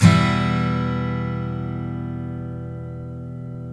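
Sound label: STL E Minor DN, Guitars steel chords; Spectrasonics; Hans Zimmer Guitars VOL-1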